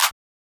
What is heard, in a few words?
Clap